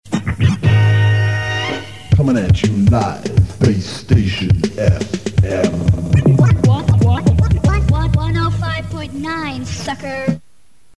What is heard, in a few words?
Jingle; Base Station 105.9